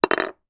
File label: toy plastic small hollow down on surface